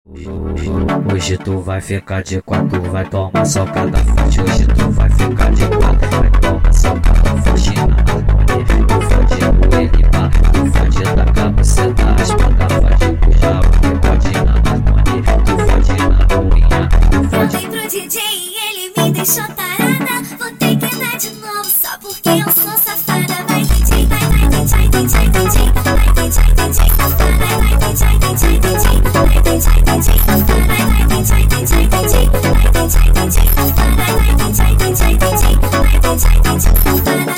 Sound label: sped Up, funk